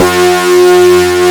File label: FUZZ SYN; WORS STATION; ROCK